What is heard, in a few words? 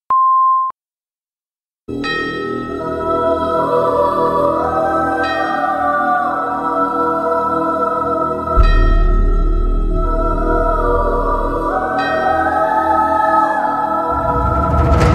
floor music; gabrielle douglas